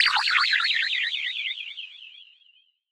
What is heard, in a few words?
Lazers Out; Antidote Advent; Infected Track Drums Ableton Project